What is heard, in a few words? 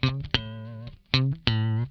lick scale, funk guitar; 90 s Sample CDs; Zero G